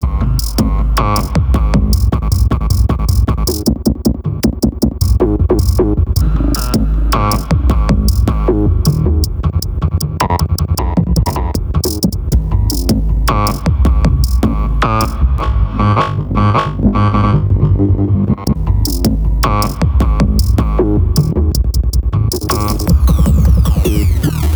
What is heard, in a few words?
Quirky-Stutter-Glitch-Electronic